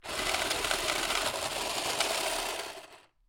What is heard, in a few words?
Playing Cards Automatic Cards Shuffler Run